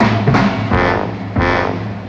DRUM HORN RIFF; Rarefaction - Poke in The Ear With A Sharp Stick

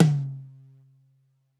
Drums, Kawai K4, Drum Machines

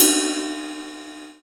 Misc Cymbal, EMU PX-7 Drum Machines

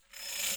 JINGLE ROL, Brazil Drum Perc; E-MU Producer Series Vol. 7 – Old World Instruments

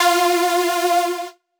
Lead - F; 99Sounds; Monosounds; Music Loops; Instrument Oneshots; Leads

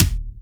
Kick, Akai MPC-500; Toolkits; Kick TKit

